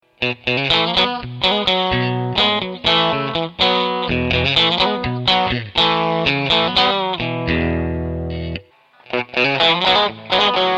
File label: Vox Country